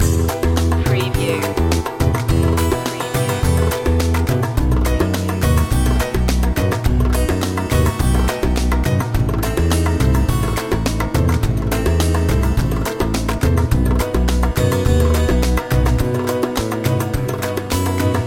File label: middle eastern oasis loop